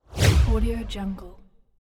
whoosh

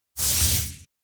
white light short searing bursts rumble hiss

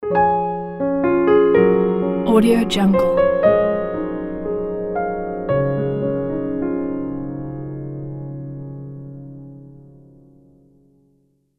smooth piano logo; alandra

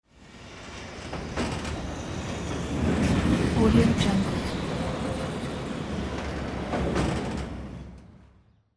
heavy door sliding open or closed

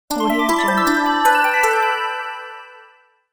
electronic magic arp

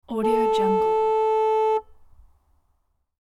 long motorcycle horn honk; sound ideas

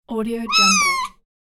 kitty; catsoundstudio

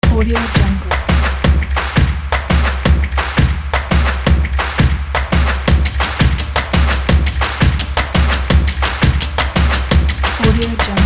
upbeat stomps

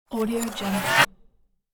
cinematic riser

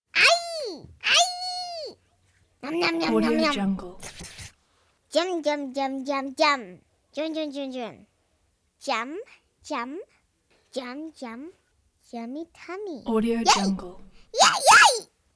chinese baby child voice agh nom nom yay

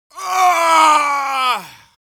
human vocal male death

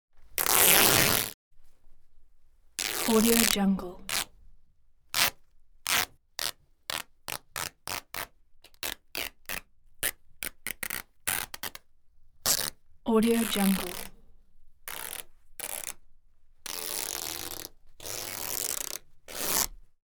ripping table tennis net tearing apart

